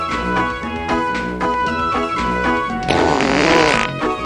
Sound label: farting